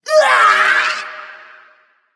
grunt hurt; smite; thanatos

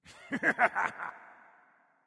lobby landing minion; smite; zhongkui

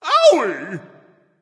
ouch, voice; Smite; ymir skin12

